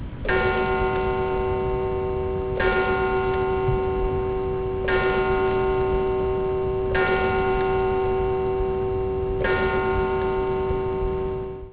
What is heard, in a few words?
clock